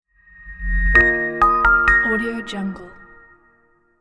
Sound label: bright modern logo